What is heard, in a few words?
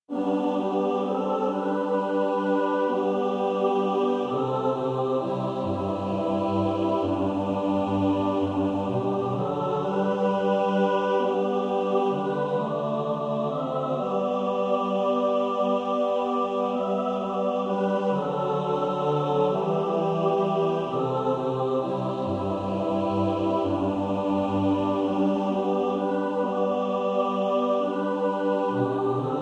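mixed, practice music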